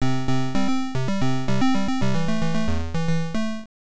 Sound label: Round Start Music; Dig Dug II; NES